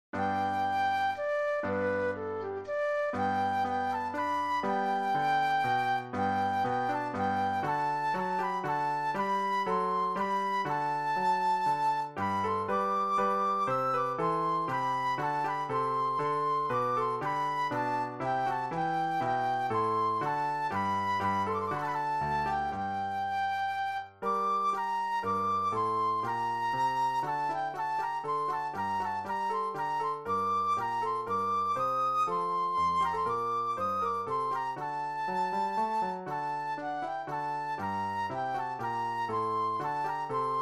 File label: Minuet Flute Solo; classical; Handel; HWV 351